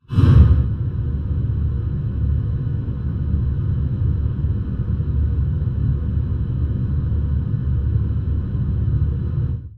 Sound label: Gas Torch Flame